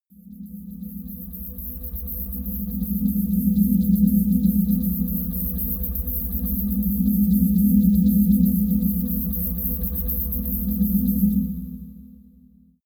dangerous flying ufo

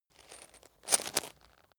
chain mail movement

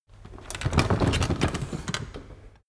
cart medieval drag start stop

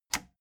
plastic button latch loose push enable

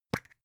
plastic spray can small open clatter short

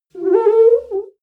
clean window paper towel slow howl

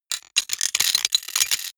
robot rustle click

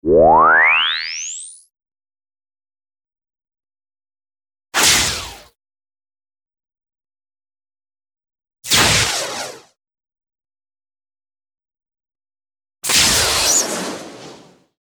phaser

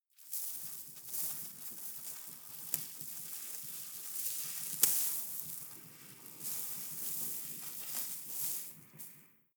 snow trickle soft

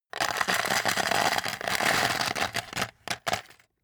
paperclips drop paper box hard

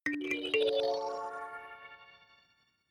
notification kalimba magical triad up major

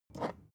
glass bottle pick up light

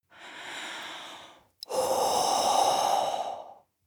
breath female eerie

